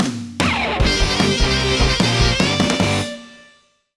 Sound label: Touchdown; NFL Rush Zone; Leapster Explorer